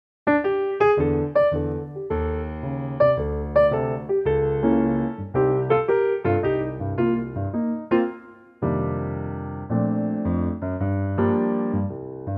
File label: Bb piano, male